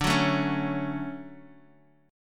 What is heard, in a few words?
D-Major 7th, D, Guitar, Open D